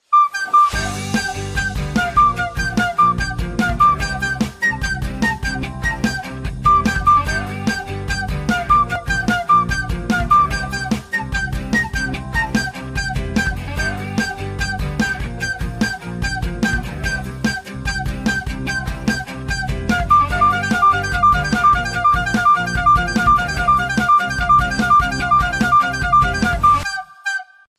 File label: Bird Flute